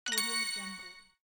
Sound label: level up